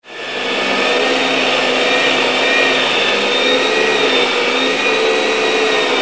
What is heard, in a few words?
Vacuum Cleaner; Sounds For Sleep